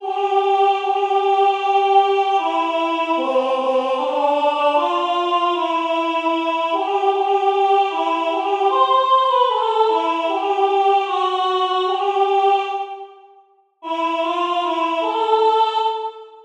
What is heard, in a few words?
Hopefully God Soprano